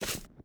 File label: grass, footsteps; player